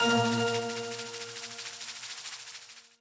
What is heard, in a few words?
reel spin; Temple of Luxor